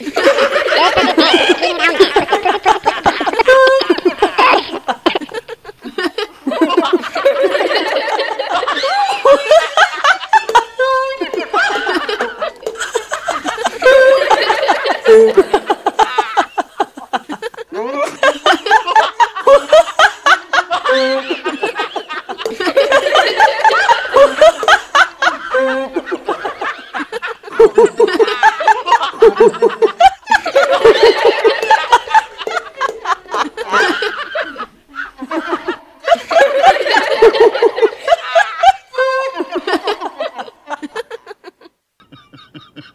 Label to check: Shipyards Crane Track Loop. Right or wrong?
Wrong